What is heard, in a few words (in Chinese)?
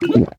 流浪商人饮用药水声